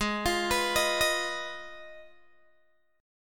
Ab Minor 6th Ab, down, Guitar, Open G

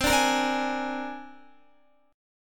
C 7th Suspended 2nd Sharp 5th C down Guitar; C7sus2#5 strummed; JGuitar

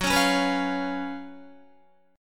F sharp Major 7th Suspended 4th, Guitar

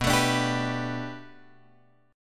B Minor 7th Sharp 5th B Guitar Drop D, chord

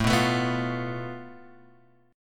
A Suspended 2nd Sharp 5th, down, Guitar, Open G

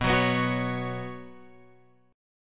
B Suspended 2nd; Bsus2 Chord {7 9 9 X 7 9} strummed

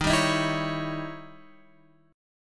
Eb 7th Flat 9th, Guitar, Open F; x,10,7,10,7,8; Eb7b9 strummed; JGuitar

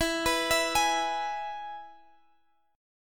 E Major, Guitar, Standard; arpeggiated; chord; x,x,14,16,17,16

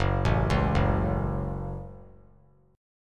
F sharp Minor F sharp, Chord; arpeggiated; JGuitar

